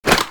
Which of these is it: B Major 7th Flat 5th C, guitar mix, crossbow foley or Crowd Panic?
crossbow foley